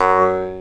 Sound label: JEWS HARP C; Proteus3